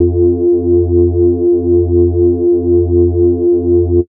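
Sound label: bass sustain skinny F; Polyend; ARC Noise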